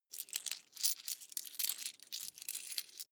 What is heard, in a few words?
coins clatter soft